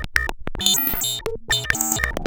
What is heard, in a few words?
Cwejman S1 - Error; Error Trck; DevSnd Cwejman S1 - RD